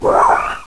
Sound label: pain; opentdm